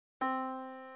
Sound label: Yamaha Disk, piano sound